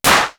FAT CLAP; Univers Sons; Basicussions

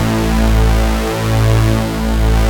SYN POLYS, dance synth; Sound & Vision - Gigapack I CD 2 (Roland)

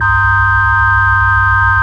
ORGAN, Drum & Bass, 160 BPM

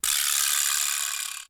VIBRA, PERCUSSION

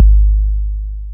KORG 01W KIT; Rubber Chicken Software Sample Bank

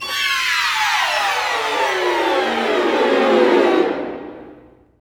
STRING GLISS; Roland - LA Composer vol. 1

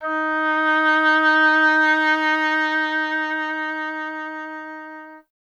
oboe D; Miroslav Vitous; Solo Instruments VOL-2

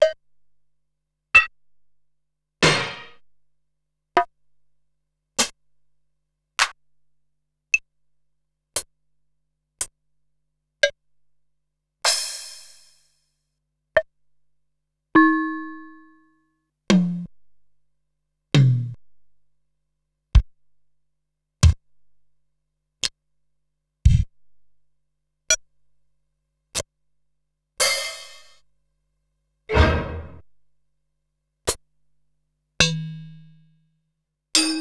Ensoniq SQ-R-Plus

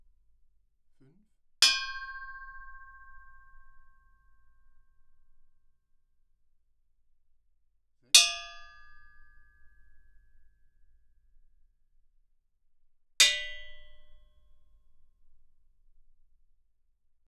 Gamelan; Propeller Island - Complete Gamelan

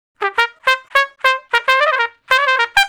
Trumpet Straight (G); Crimson Blue and Fabulous Horncraft 4 RnB; Horncraft for R&B; Solos

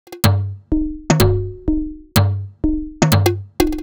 Top A# 125bpm, House Drumloops; 90 s Sample CDs; Zero-G House Fabrik Drumloops; ZG Drumloops

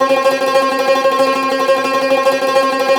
CUMBUS TR, Cumbus Tremolo

